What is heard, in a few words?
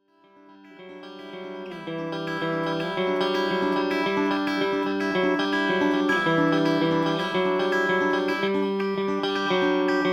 cluck